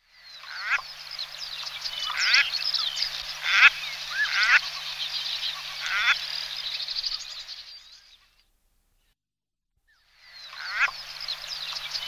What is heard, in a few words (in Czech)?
husice liščí